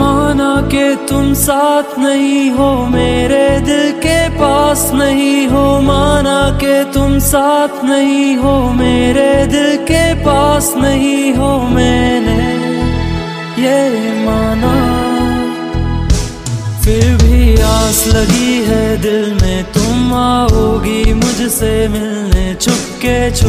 sad music ringtone, bollywood

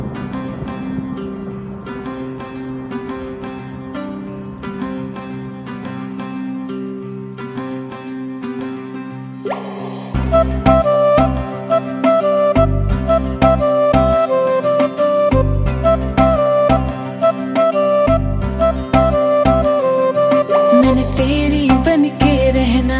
hindi music ringtone, bollywood

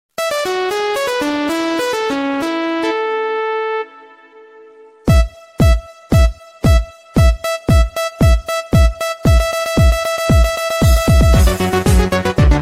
nokia in new style ringtone

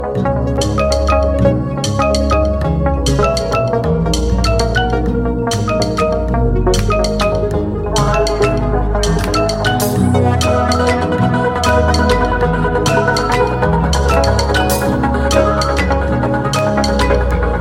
lg velvet 5g ringtone

